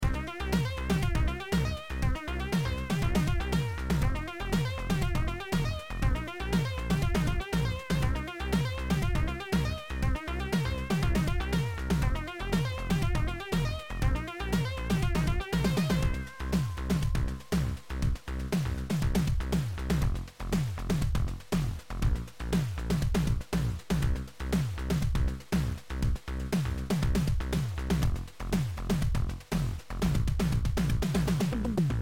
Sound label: bass basstone lead sound beat